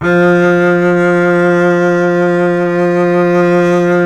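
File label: string contrabass F; Miroslav Vitous; Solo Instruments VOL-2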